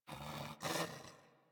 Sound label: Pet Snore; Enemies; Warframe; Catbrow; PetShip